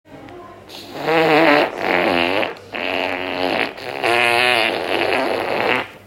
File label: farts; tacobell